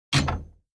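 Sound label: Gym Weight Equipment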